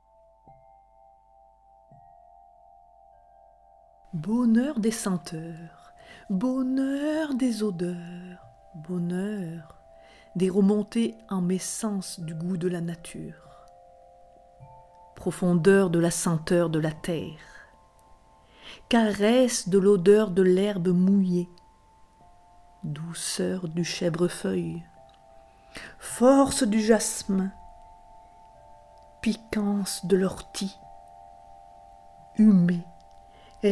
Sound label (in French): senteur harpe pad